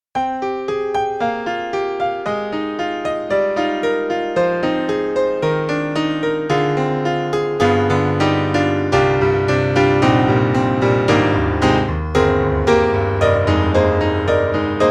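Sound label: Yamaha C7 Close Funk, piano; Steinberg; The Grand 3; Play Da Funk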